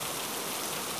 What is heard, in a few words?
rain, weather; ambient; minecraft; TBMPack